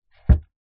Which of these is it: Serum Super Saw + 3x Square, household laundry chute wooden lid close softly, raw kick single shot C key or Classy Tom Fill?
household laundry chute wooden lid close softly